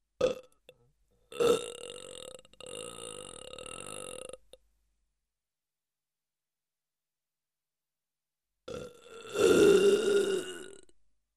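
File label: comedic slow burp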